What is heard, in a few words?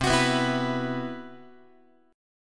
C Major 7th Flat 5th C, Guitar; CM7b5 strummed